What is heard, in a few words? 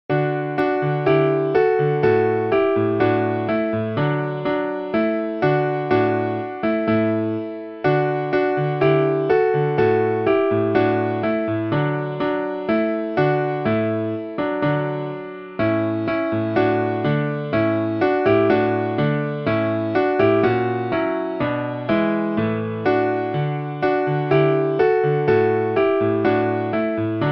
symphony 9 ode to joy piano solo; beethoven